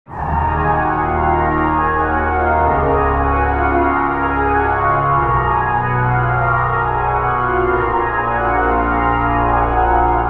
Lead; Routed Sound; Serum 2 Expansion - Street Vanquisher Vol.1

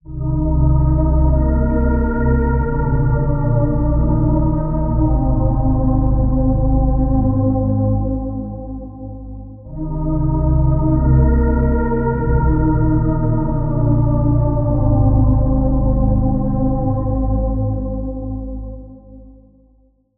Pad; Outer Realm; Routed Sound; Vital Expansion - Reality Maker Vol.1

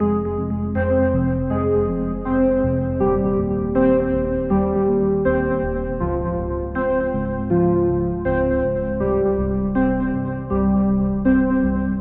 Key; Conscious Machine; Routed Sound; Vital Expansion - Reality Maker Vol.1

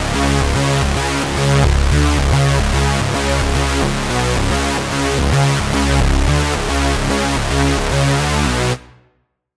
Lead, Sylenth1; Routed Sound; Sylenth1 Expansion - Quantum Enforcer Vol.1

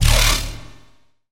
Bass - Demon Laser; Routed Sound; Serum 2 Expansion - Chaos Bringer Vol.1

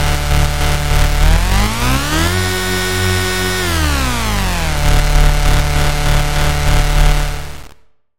Engine Accelerator; sfx; Routed Sound; Sylenth1 Expansion; Chaos Bringer Vol.1

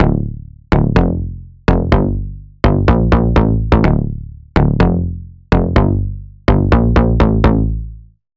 Bass; Criminal Virtue; Routed Sound; Vital Expansion - Noble Gangster Vol.1

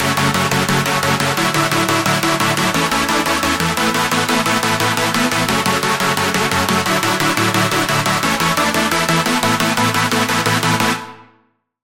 Chord

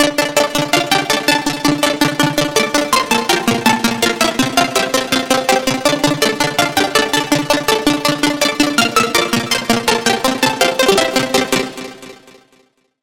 Pluck; Source Code; Routed Sound; Vital Expansion - Hyper Gamer Vol.1

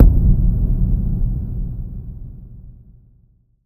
Impact, Sylenth1; Impact - Abyss Commotion; Routed Sound; Sylenth1 Expansion - Quantum Enforcer Vol.1

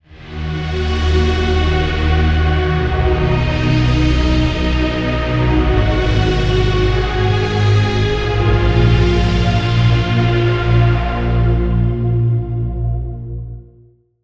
Pad; Routed Sound; Serum 2 Expansion - Reality Maker Vol.1

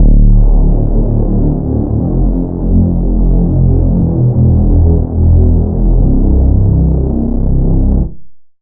Reese; Cash Stack; Routed Sound; Vital Expansion - Noble Gangster Vol.1

